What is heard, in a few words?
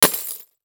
Impact Glass Debris Hit By Solid Metal Pole; Impacts